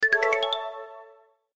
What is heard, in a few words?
event completed; Delicious Emilys Wonder Wedding